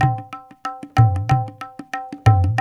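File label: 93 bpm, tablas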